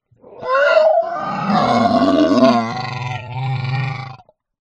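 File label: animal dinosaur vocalisation growl; zapsplat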